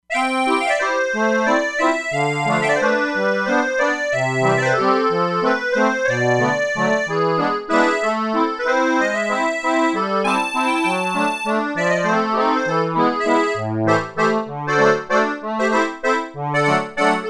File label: Accordion, E-mu Proteus-3; Factory patches